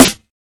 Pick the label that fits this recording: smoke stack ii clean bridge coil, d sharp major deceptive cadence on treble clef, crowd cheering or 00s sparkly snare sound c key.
00s sparkly snare sound c key